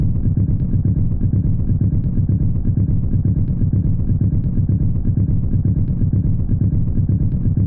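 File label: bass 125 bpm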